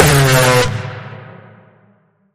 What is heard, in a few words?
Bend The Alarm